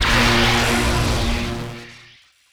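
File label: Laser Fire Interior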